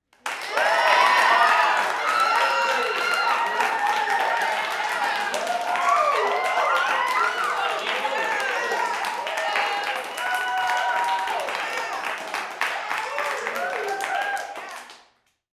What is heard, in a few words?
small young crowd clapping